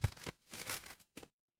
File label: paper fabric inventory